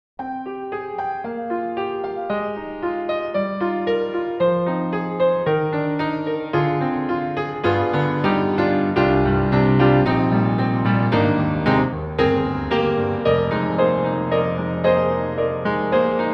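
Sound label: Steinway D, Binaural, piano; Embertone; Walker 1955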